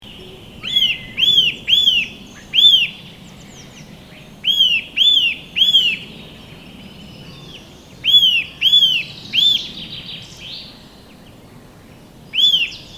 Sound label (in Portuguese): trepadeira azul